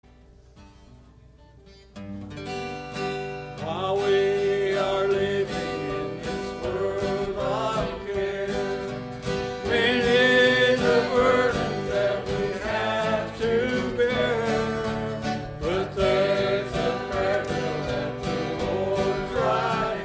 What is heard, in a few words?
Prayer Bells Of Heaven Choir